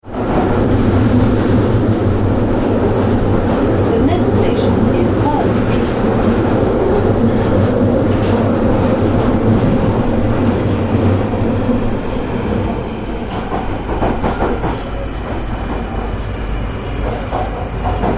Tube Train Journey, Riding on a tube train on the London underground.; Trains (Sound Effects:Vehicles)